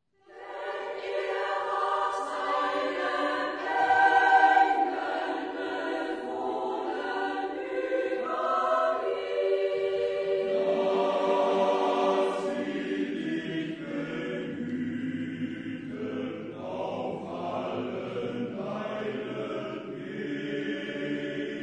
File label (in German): chormusik